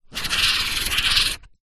office marker pen highlighter luminous stroke on cardboard